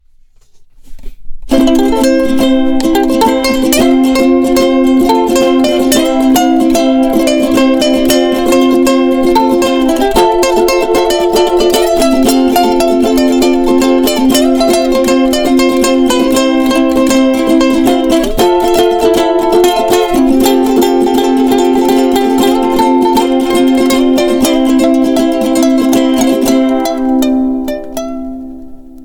Ensemble

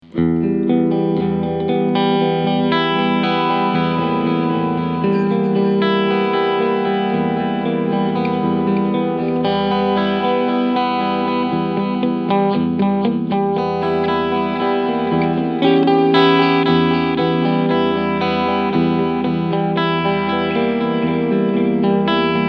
Delay Pure

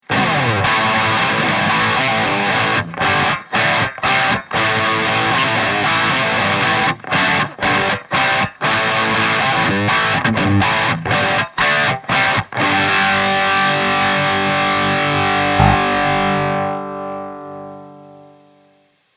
Heavy Crunch Notch

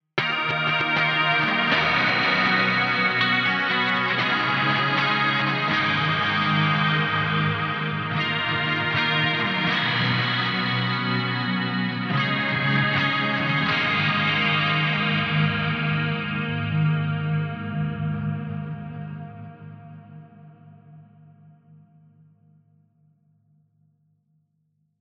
Riff Pro Q 3

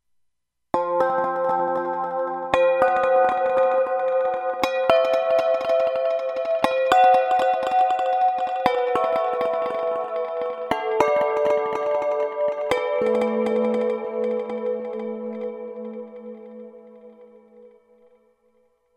Plektachime

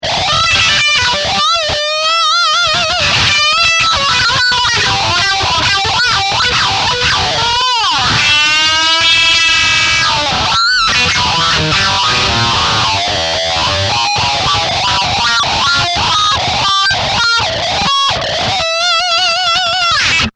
Lead

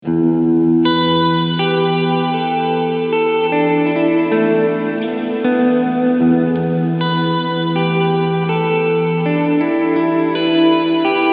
JHS Pedals 3 Series Hall Reverb Cleansound; Dampen 0; Decay 1 Uhr

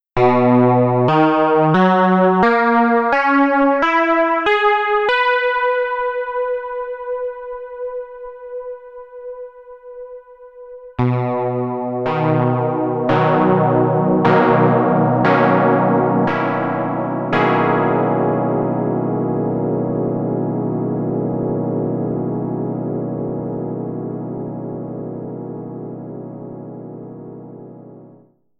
Pulse 2 Osc 1 Unison

